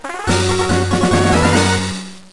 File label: Slots Win; infinitefusion